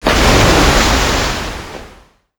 Water Big; Pacificwar

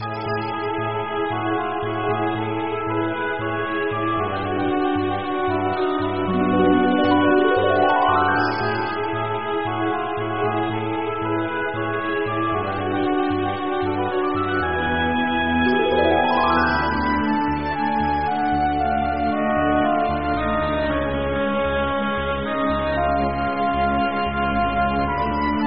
Dream Land

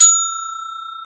Bell

